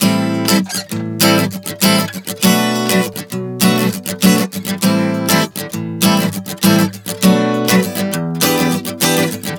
Progression 100 Am-Bm-Am-Bm-Em7, Acoustic Guitar, Chord Progressions 100 bpm